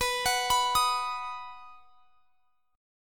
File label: B Major B down; arpeggiated